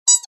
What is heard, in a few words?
Multimedia Internet CD Rom Flash Animation Cartoon